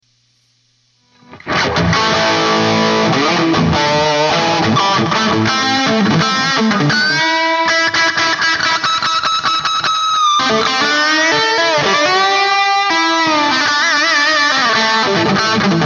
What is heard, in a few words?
Plexi Noodle wet